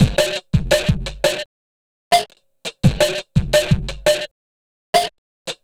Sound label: Chirrp Beat, Drum N Bass; Sonic Foundry (Sony Creative Software); Knutrix Drum & Bass